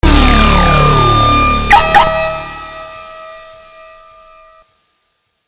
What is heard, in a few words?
tank park